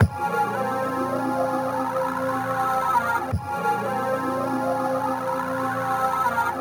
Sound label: Loop